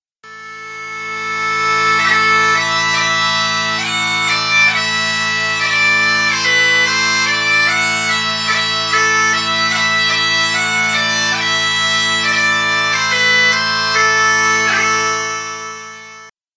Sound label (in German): Gr Marktpfeyf, Dudelsack